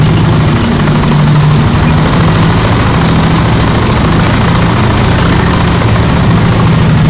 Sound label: panzer3 engine rpm 66, tanks; engines; War Thunder